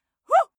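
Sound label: female attack wuh, screams; schreie; menschen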